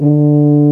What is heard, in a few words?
Tuba D; Timidity